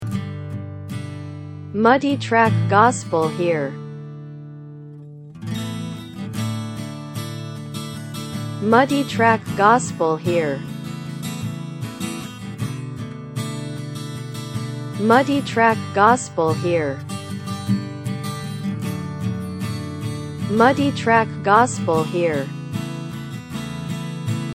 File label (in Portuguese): VIOLAO